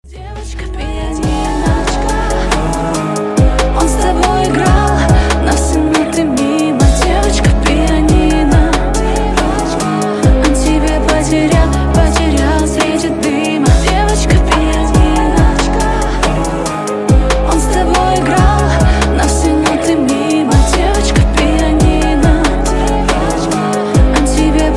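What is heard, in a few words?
upright piano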